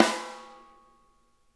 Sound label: SNARE EDG; Sonic Reality; Interactive Drum Kits